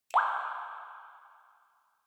Water Drop Low Reverb

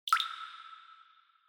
Water Drop High Reverb

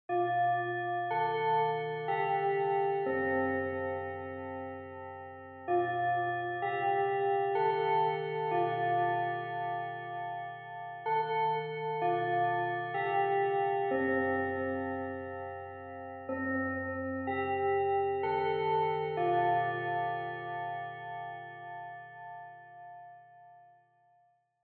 Japanese School Bell Far Slow Long

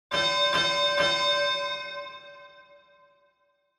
Boxing Bell

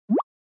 Anime Motion Low

